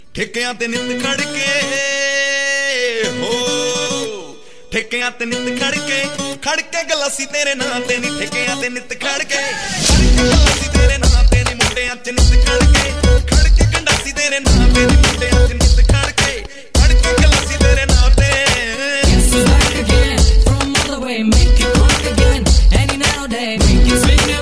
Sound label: Glassi Tone Punjabi